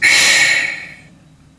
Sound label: direct air, Train; OpenBVE; 55022 Royal Scots Grey